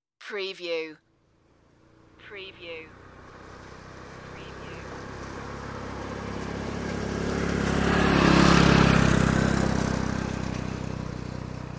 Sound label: passing motorbike